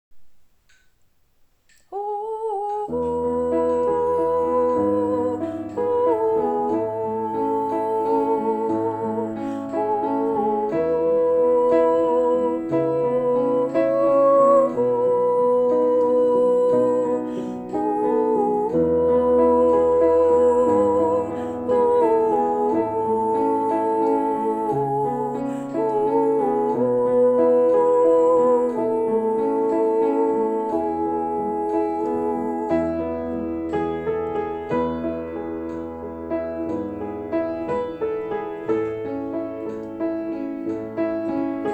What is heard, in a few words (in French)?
chant d'entree tenor; tenor 2